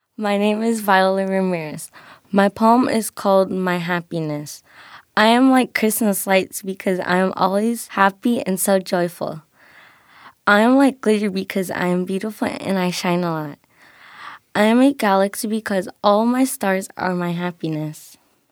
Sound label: Viola